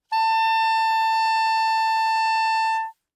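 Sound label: Soprano Saxophone non vibrato ff A4; Woodwinds; Bb Soprano Saxophone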